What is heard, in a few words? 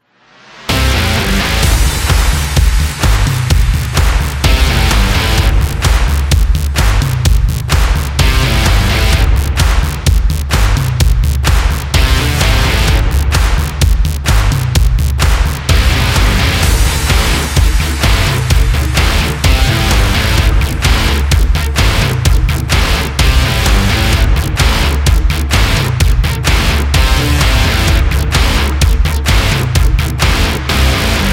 Power Rock